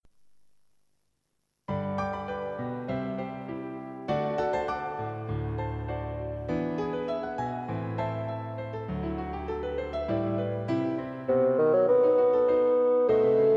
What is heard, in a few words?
Bassoon; Bassoon Solo and Piano